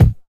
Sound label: short kick drum one shot A key